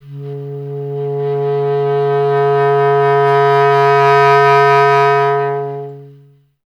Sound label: B. Clarinet